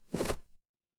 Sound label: paper fabric fabric